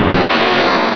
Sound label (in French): Cri